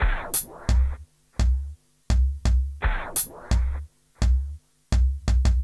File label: Smooth, R&B Drums